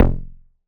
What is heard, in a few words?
BAS F1, Yamaha TX81Z synth bass